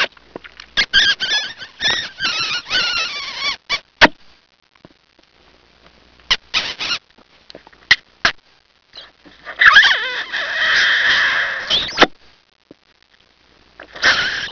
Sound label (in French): bisou; etreshumains; Pinnacle